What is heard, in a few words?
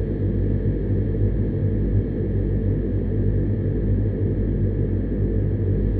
InfiniVerb Voice, sparse soundscape Loops